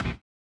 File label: collision; Spectrasonics; Metamorphosis